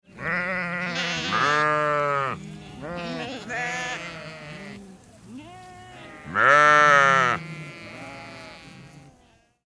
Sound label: Sheep; ringtone